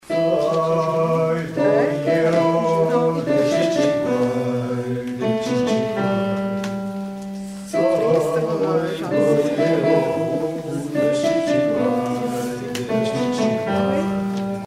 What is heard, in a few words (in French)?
HOMMES Couplet; chants